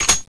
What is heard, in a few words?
clipin; weapons; cstrike; bb weapons